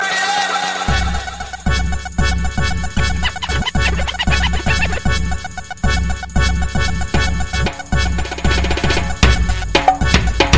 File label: club arabic